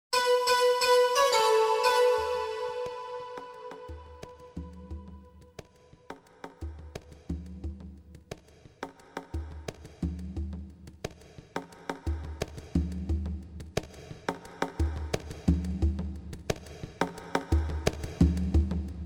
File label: (Natural Trance Mix)